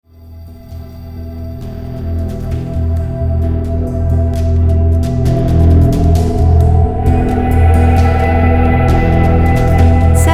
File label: Ambient Dark Film Series